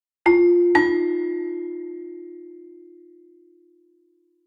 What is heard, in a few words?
Marimba